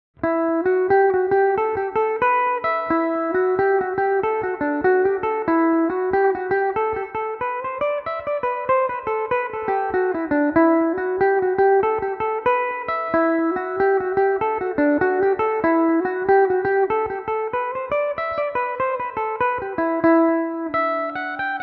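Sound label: E minor belmuse, irish mandolin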